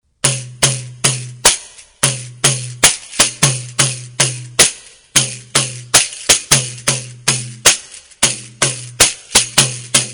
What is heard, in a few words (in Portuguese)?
Pandeiro